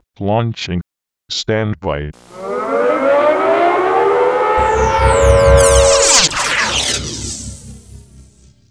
sat, satellite strike

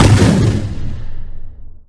strange blast

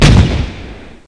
tank fire

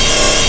laser loop; laser gatling

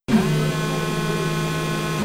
arm sound; my mod and graphics sound

